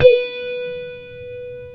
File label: WOW GT1-B3, WOW GUITAR; AKAI S-Series CD-ROM Sound Library VOL-7